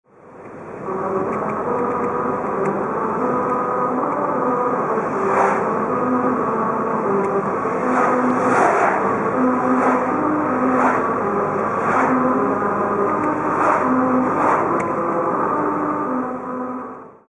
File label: melody line